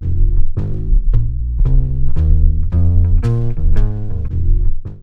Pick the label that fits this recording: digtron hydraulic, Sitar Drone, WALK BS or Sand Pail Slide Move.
WALK BS